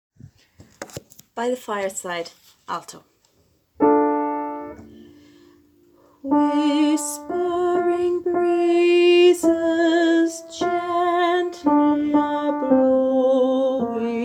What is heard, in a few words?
alto